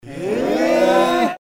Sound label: surprise